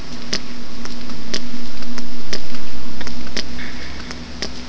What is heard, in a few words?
new clicks, squid